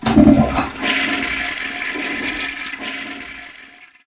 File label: toilet flush; homedecor modpack